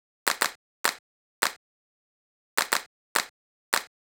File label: Claps, 104, Cmin, Drum Tracks; Hip Hop Exotica; Big Fish Audio